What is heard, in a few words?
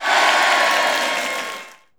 MAP SONG CLEAR; maimai